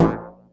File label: Zip Boing, cartoon; Classic SFX; musicradar; cartoon caper